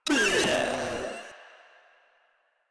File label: Proto Infested Runner Pain; Warframe